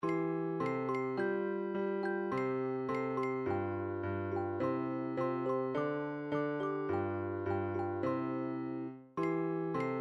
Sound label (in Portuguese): infantil, midi